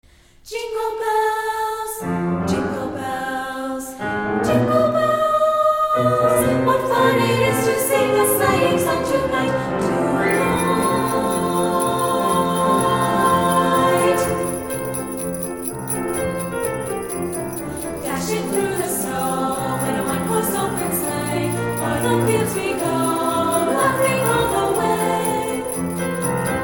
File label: jingle bell fantasy